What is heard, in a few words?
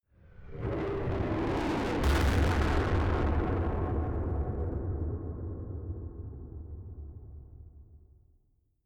distorted blasts